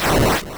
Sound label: segment explode; Moldorm; Zelda